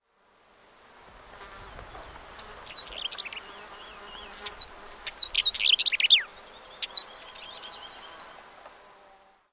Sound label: northern wheatear